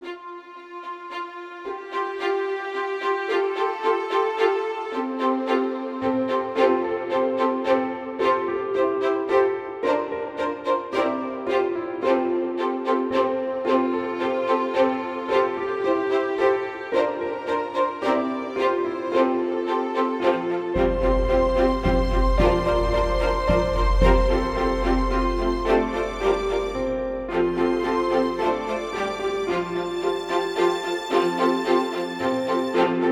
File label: High Voice Minus Track; On Christ the Solid Rock I Stand